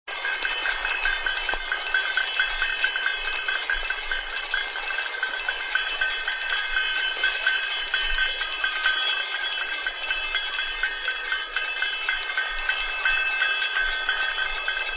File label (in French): cymbale